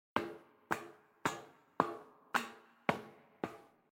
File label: Iron Ladder